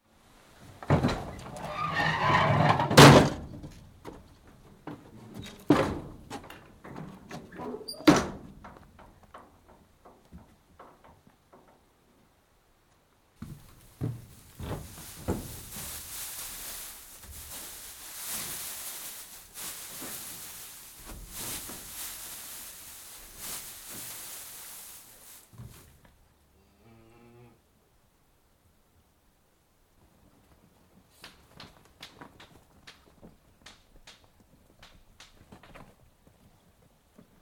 Cattle, calves loaded/unloaded from trailer, rear tailgate opened/closed, straw spread, calves loaded, faint wind in trees; Cow Sound Effects; BBC